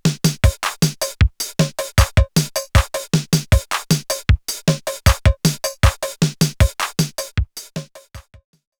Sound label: 707 Drums and Percussion